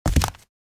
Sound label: Flip flops single step on wooden floor